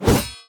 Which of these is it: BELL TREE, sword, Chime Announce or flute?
sword